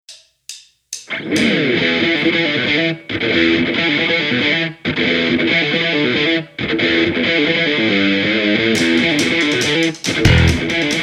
riff

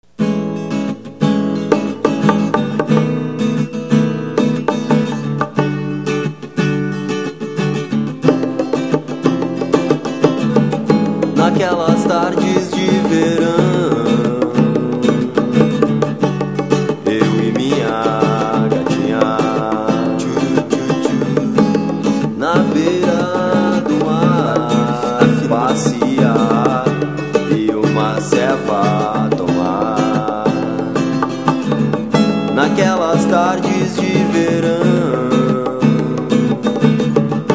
trumpet

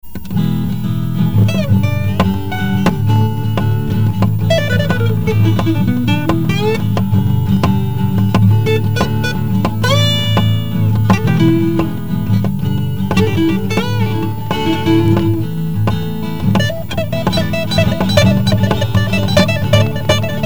flashback

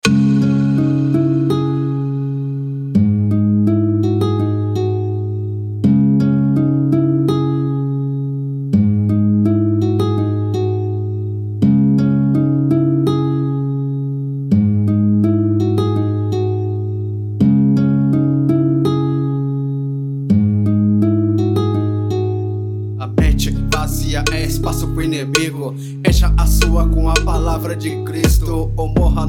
funk trap

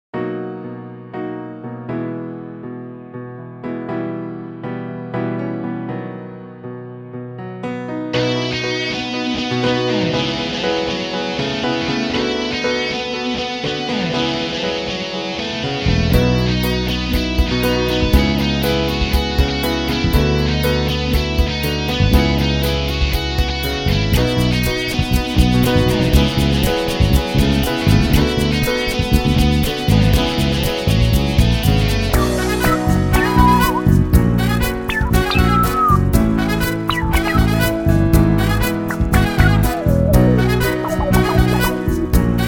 instrumental

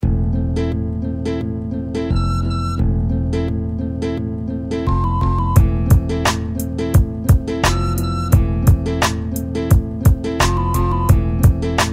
instrumental

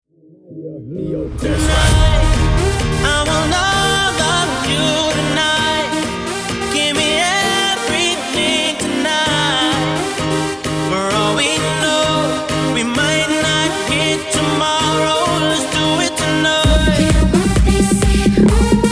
rave funk remix

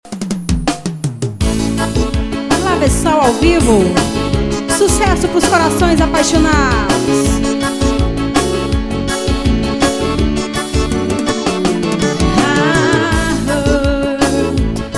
forró

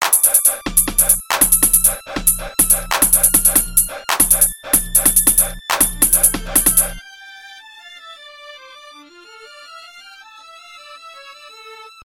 hiphop; basement

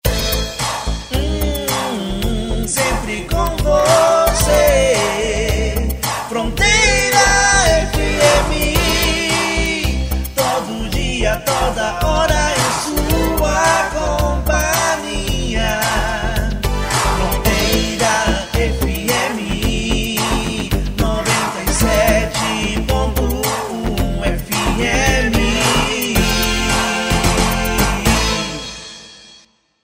fm jingles

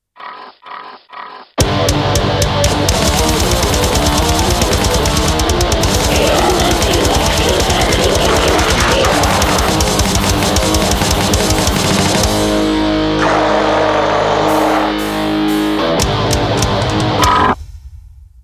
grind

